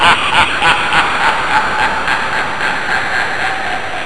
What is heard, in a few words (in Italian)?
suonerie persone risate